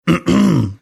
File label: throat clearing